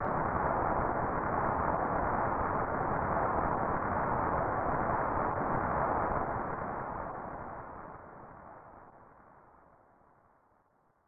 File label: Fierce Rainstorm; Legend of Zelda, The - A Link to the Past